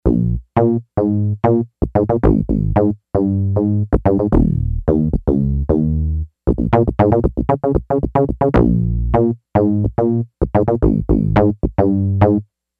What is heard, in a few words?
Synth Bass